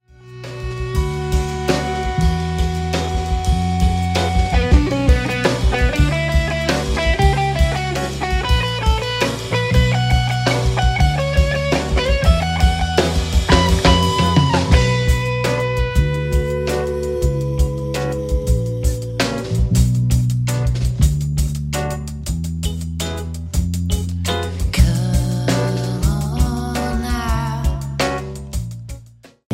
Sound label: Dark Waves; SecretForest